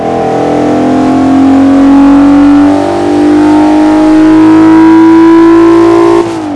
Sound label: third, vehicles; gmod; tdmcars; eclipse gt